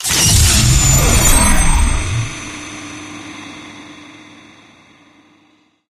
powerup pickup base; Source Engine; tf

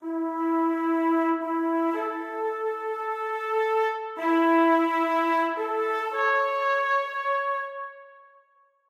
taps; Source Engine; tf

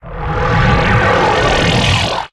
teleport send; rescue ranger; tf

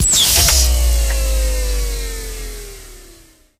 hologram stop; Source Engine; tf

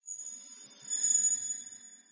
metal; Source Engine; cstrike

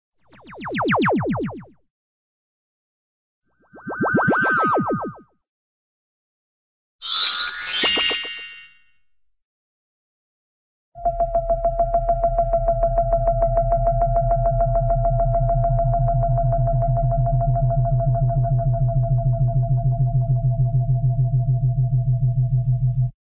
sci fi ray; cartoon; green lantern

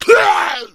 hunter pounce cancel, voice; player; source engine; left 4 dead 2

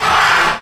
metal frame scrape; Source Engine; Portal 2

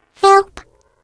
turret pickup

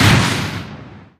giant common step; source engine; tf; mvm; video games

